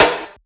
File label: Percussion; FLPRO